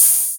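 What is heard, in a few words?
808 Hat Open; Novation DrumstationV1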